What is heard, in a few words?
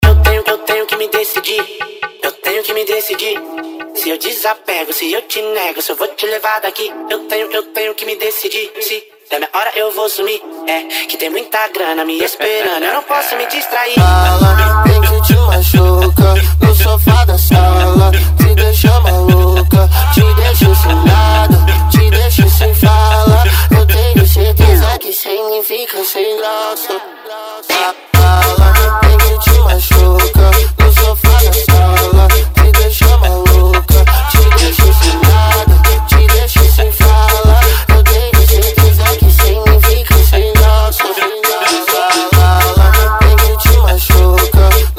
funk mega bass